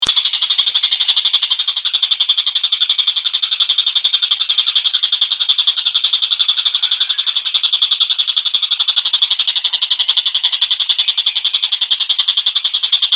tengkek buto; om david susilo

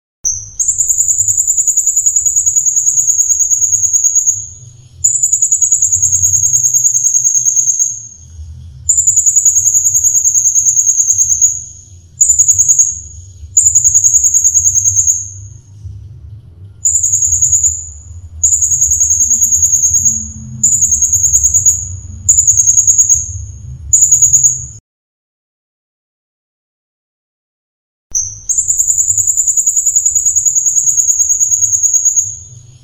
konin kolibri ninja; steve taylor; om hanapi pekanbaru

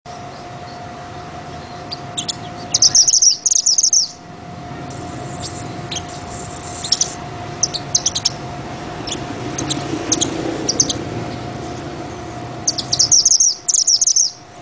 suara burung mantenan

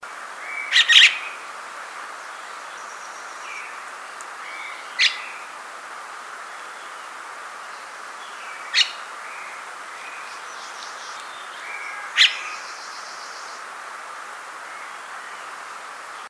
jalak kapas call

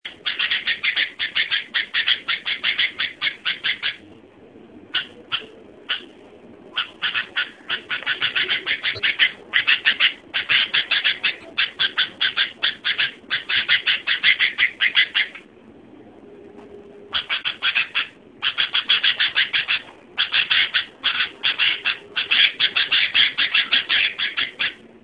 suara burung kutilang

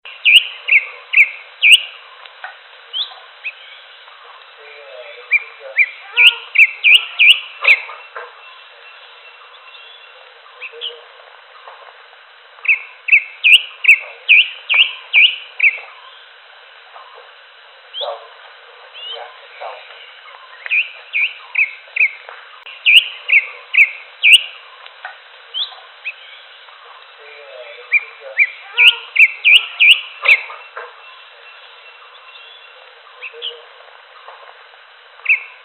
cucak hijau alam liar

